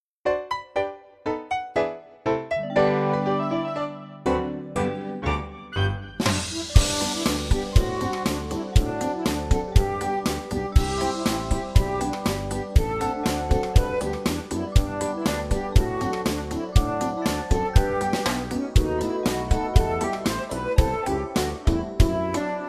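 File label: backing track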